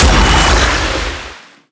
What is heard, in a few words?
slithereen crush; weapons; takoyaki; dota; slardar